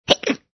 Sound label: HUMAN HICCUP